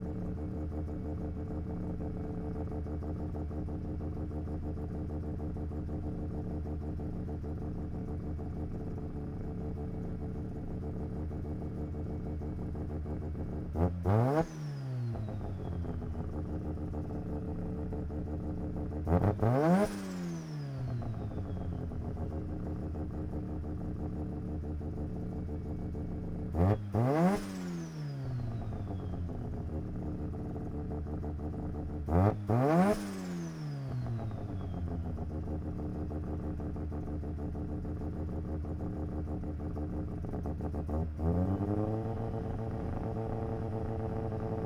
Mazda RX7 Ext Idle Blips Steadies in Neutral Off Wide; 1990; Pole Position